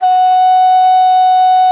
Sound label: BON DI, Winds, Old World Instruments